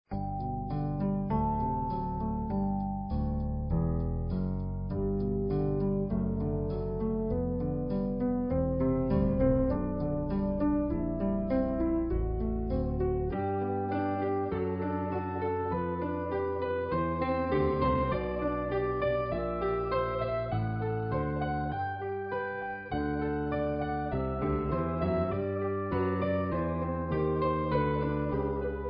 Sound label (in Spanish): Escala sol mayor ligera, violin